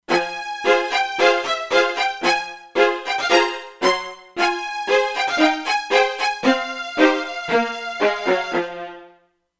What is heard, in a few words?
Arco Strings, E-mu Proteus-2; Factory Presets